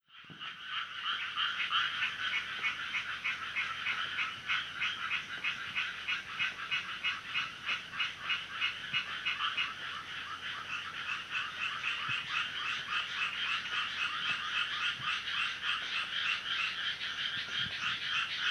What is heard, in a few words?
frog chorus